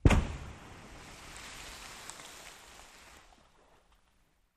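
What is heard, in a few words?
Underwater Explo Med, explosion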